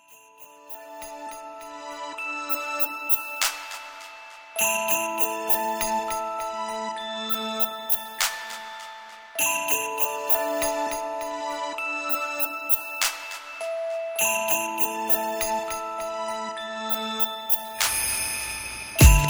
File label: softly analog